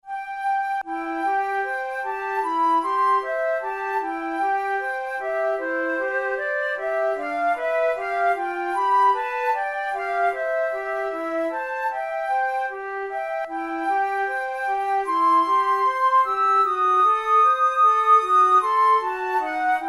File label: duets, flute; kohler